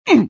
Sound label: Pain male